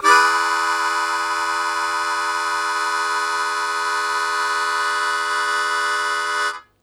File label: Blues Chord Attack E1, Harmonica; 90 s Sample CDs; Zero G